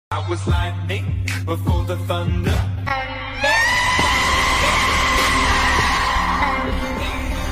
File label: scream